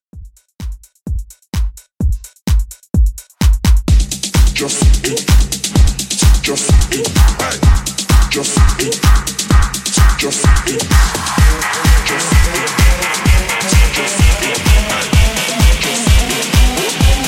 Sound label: House Redrum 128Bpm